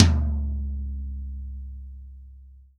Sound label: REAL DRUMS POP KIT